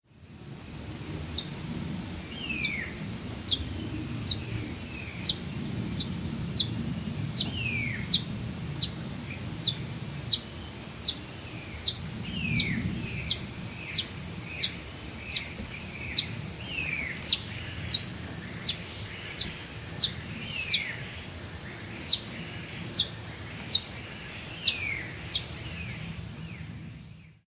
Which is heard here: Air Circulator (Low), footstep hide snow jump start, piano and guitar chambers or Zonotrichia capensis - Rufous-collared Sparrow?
Zonotrichia capensis - Rufous-collared Sparrow